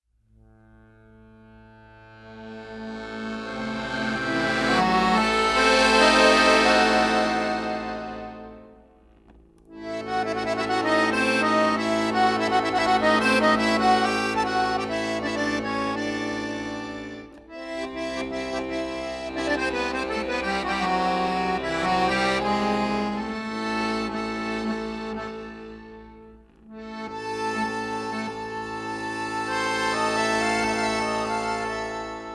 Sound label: accordion forshpil